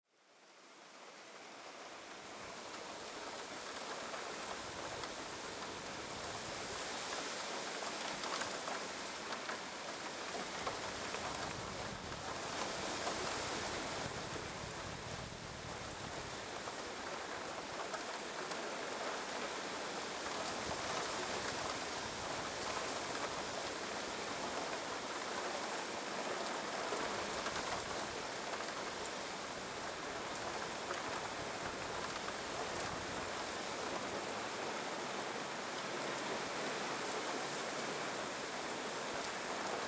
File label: hail storm; white-noise